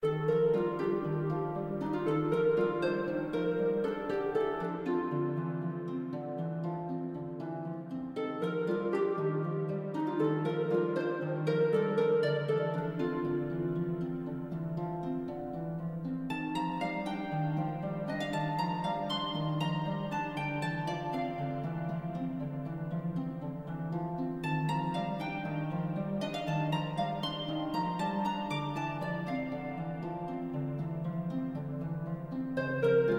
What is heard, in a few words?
Music Lizard House; Death's Gambit Afterlife; ps4 switch windows